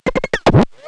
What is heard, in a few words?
death; Gonner